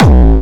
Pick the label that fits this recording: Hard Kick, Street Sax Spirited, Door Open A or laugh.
Hard Kick